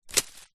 impact twig stick fall hit dried leaves forest floor; zapsplat